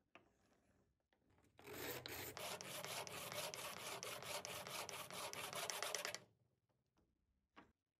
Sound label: Pencil Sharpener